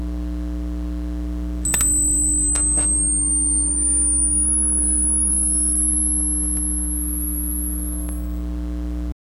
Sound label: sound of electrical arcin, arcing